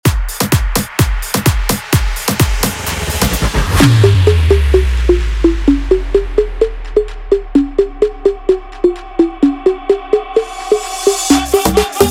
aleteo 128bpm

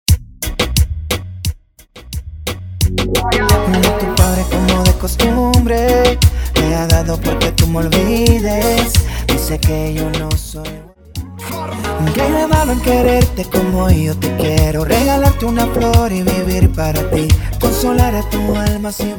Intro Outro 88bpm

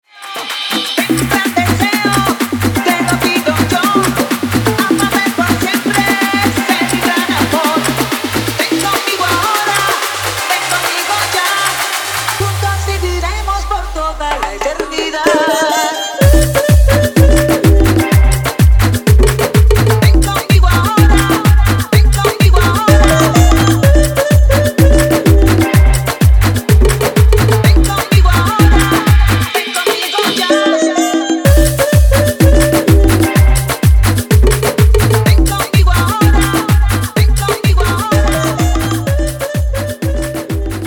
afro remix 126 bpm

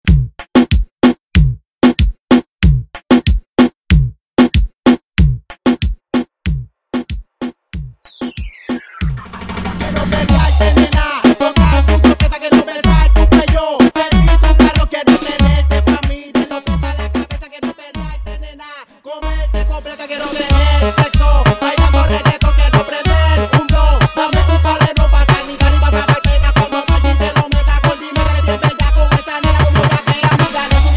Intro Chorus 94BPM

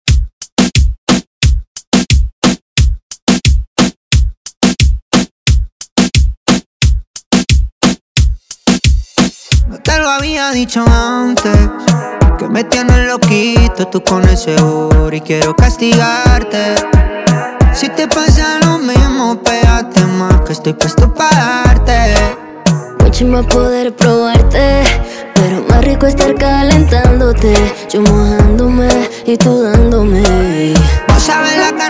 remix bpm 89

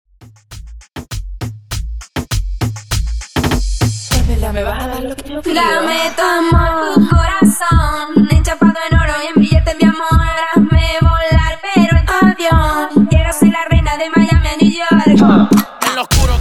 Mashup Intro Break Acapella 100Bpm

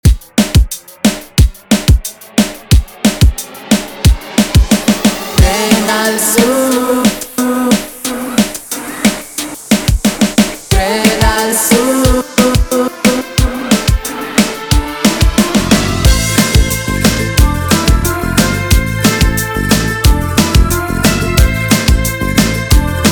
intro 90bpm

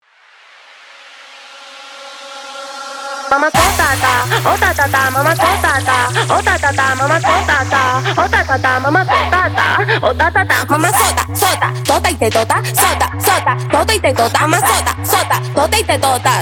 intro outro 130 bpm

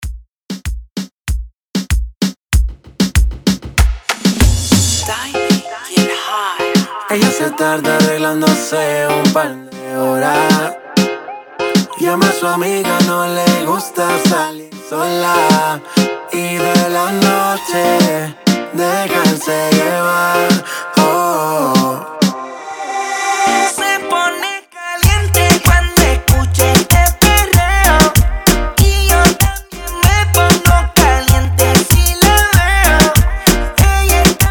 intro outro 96 bpm

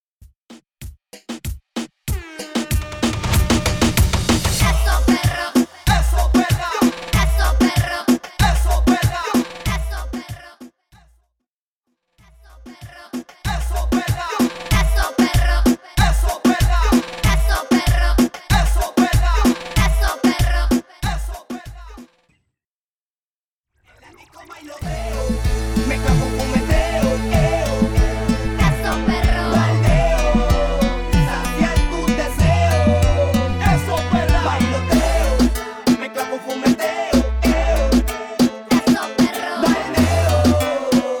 Intro Outro 95BPM

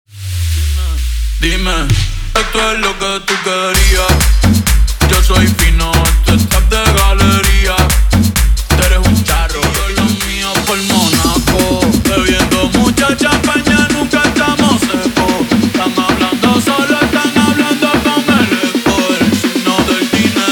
guaracha remix 130 bpm

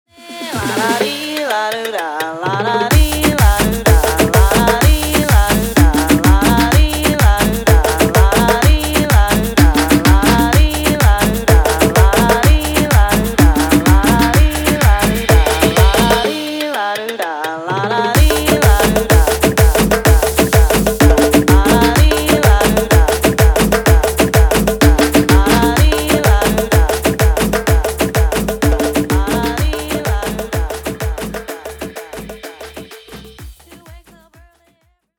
afro remix 126 bpm

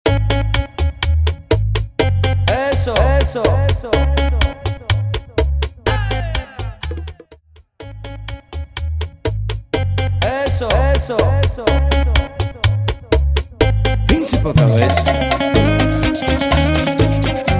bachata intro outro 124 BPM

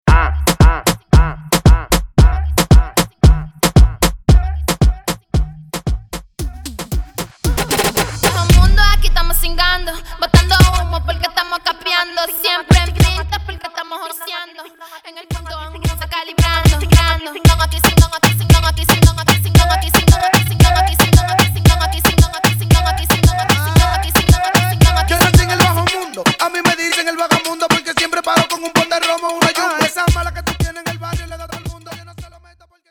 Intro Break 114 Bpm

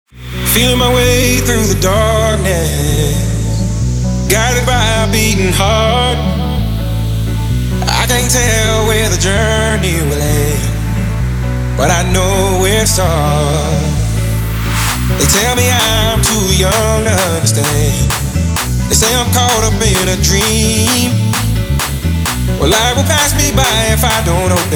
intro outro 130 bpm

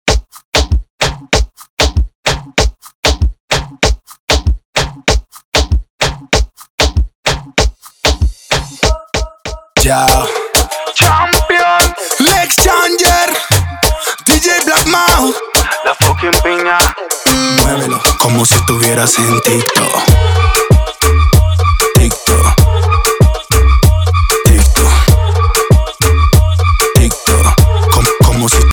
remix bpm 96

